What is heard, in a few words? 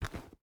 footstep rubber boots dirt walk; Rust